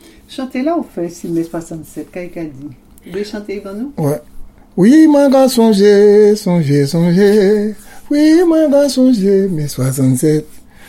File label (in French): Chant; Mai 1967